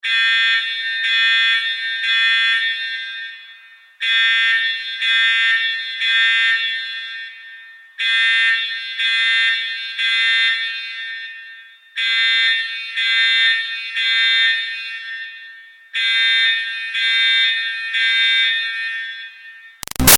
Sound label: Alarm Buzzer Fire Clean Echo; Auditorium; Alarms; Electronics; Big Room Sound